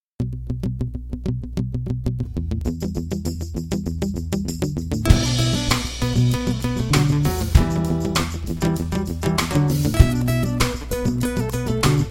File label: acoustic surf session